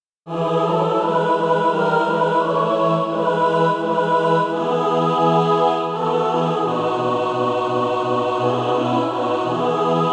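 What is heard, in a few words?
mixed, SATB Practise Music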